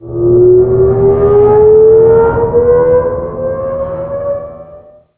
Engine Wind Up

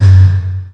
Collision

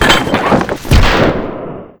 Chute

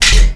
Eject Start

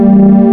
Refinery

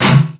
Collision Ext

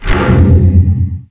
Laser Exp Int

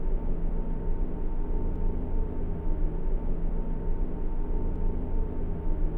Generator

